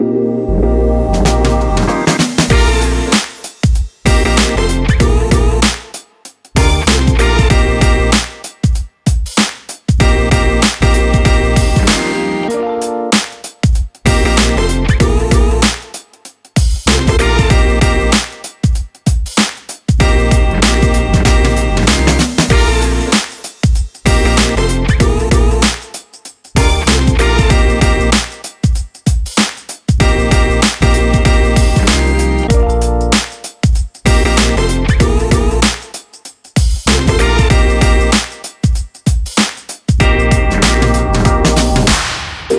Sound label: no vocal version